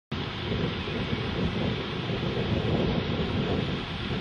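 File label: honey buzzard long flight call; allen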